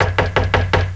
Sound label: knocking